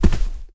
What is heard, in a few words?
Rug Walk